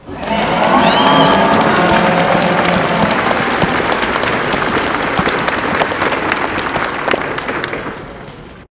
Skeleton Cheer; Princess & Conquest v0.20.06

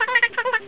Voice Dog; Princess & Conquest v0.20.06

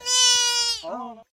Goat; Princess & Conquest v0.20.06

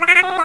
Voice Cat; Princess & Conquest v0.20.06

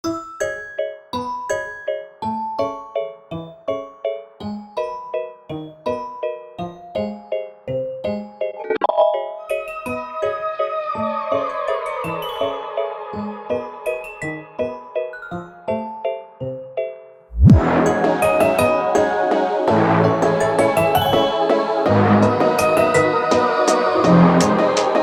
Waltz Variation

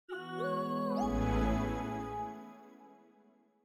Log On; Reincarnation of Windows 11 Sounds in fake Longhorn (Samsung Theme for WinXP) style by David Mounder; Longhorn Valley Sound Scheme by David Mounder

